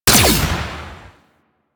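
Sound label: Igniter Pistol Projectile Explosion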